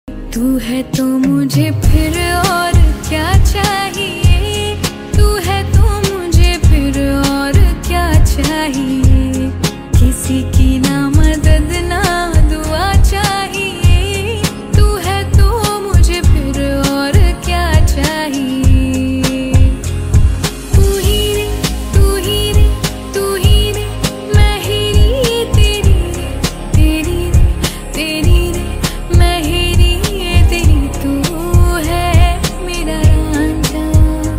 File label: ringtone female version